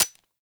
Viper Fire Mech, Weapons; Warframe; Vipers

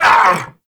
Vocal Set Pain; Warframe; Duviri; Dax

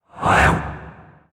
Vocal Attack; Warframe; PriestQuest; PossessedRedVeil

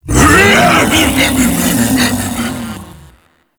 Ape Prelate Attack Long, Vocals; Warframe; Proto Infested

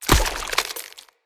Corrupted Slug Thorn Shot; Creatures; Abilities

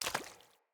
Walk Water, Footsteps; Characters; Enemy; Mockeries; Mockery

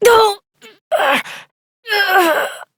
Death, Adult; New War; Operator

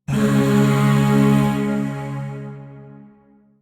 Void Shawzin Chromatic Chord B; Warframe; Zariman Void Shawzin

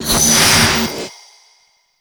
Stun Start; Warframe; Stalker

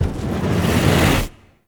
Operator Focus Blast Fireball Charge; Warframe; Operators; Attack

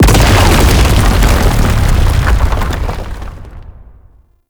Intro Rock Destruction; Intro Battlefield

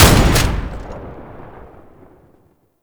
Tank Airburst Cast; Enemies; Weapons; Lasrian Tank; Warframe

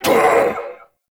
Stalker Pain Grunt; Warframe; Jade Shadows

